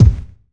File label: strong bottom end kick sample a key; kick drum samples